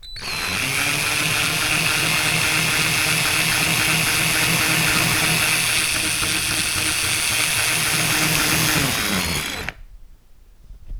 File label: cordless drill